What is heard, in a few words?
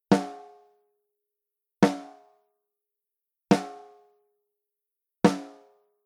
Focusrite 828 isa110, preamps snare drum